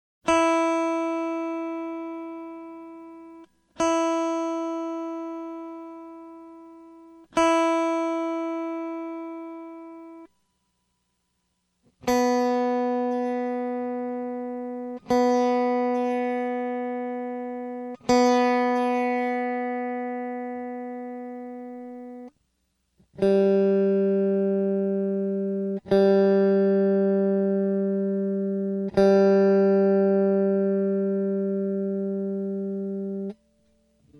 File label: Blues Tuning